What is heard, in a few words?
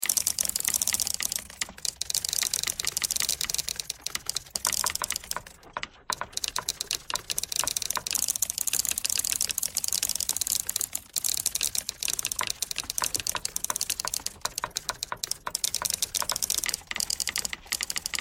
typewriter thread all